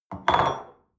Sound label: espresso upset table soft, restaurant cafe